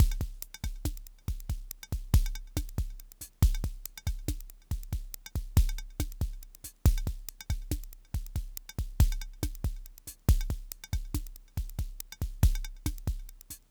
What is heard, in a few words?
drumloop; opsound